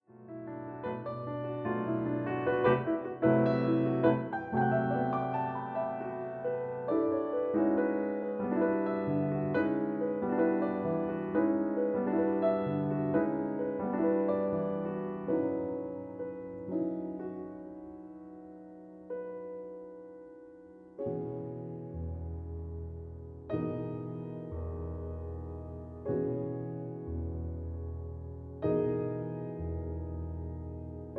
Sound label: piano sketches